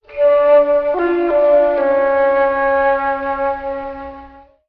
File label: flute, Sad; Loops